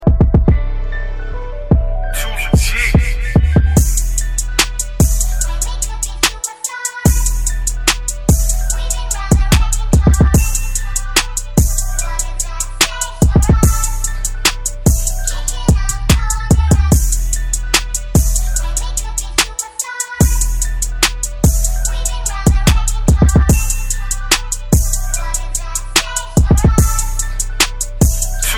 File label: instrumental ringtone